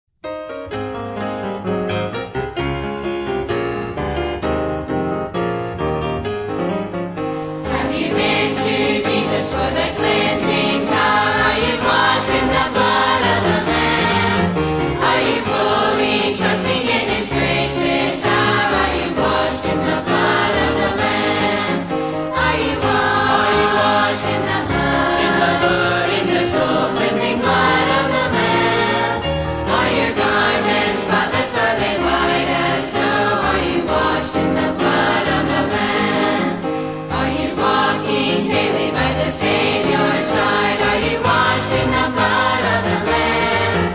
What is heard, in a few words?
teen choir